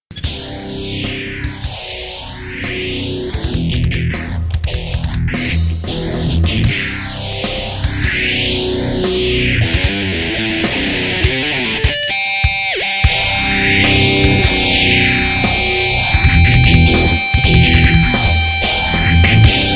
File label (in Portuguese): solo